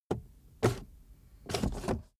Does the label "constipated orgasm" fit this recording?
No